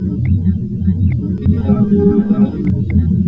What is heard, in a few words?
loop, techno atmos